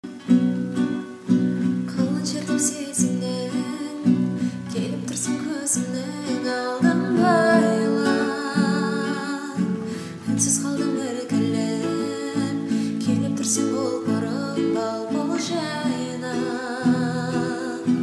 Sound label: gitara